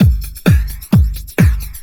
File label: techno, DRUMLOOPS